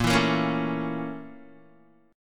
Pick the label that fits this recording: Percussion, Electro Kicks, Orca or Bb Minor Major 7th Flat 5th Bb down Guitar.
Bb Minor Major 7th Flat 5th Bb down Guitar